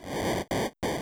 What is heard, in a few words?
Fire; mother2